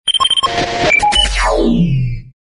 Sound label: beep radio band sweep